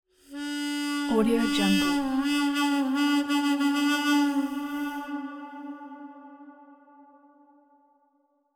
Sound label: western harmonica logo